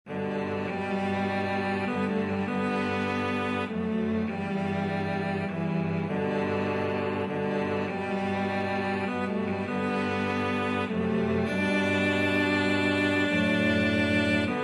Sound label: amazing grace, cello trio